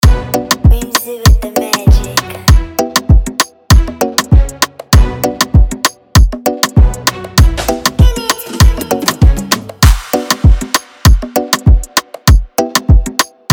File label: Afrobeat Instrumental